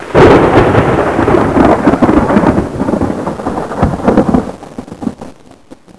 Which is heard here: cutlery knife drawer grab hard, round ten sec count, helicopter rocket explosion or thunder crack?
thunder crack